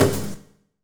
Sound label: HIT 4 FX; Bolder Sounds - Granular